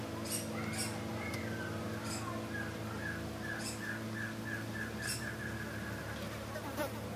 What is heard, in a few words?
Glossy Antshrike